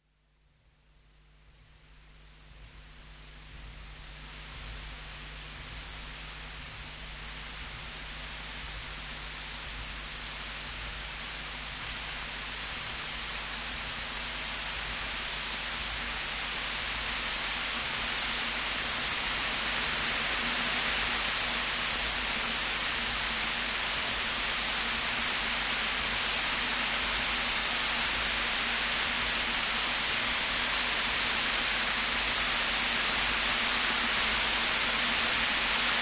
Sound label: white noise; Chosen Frequencies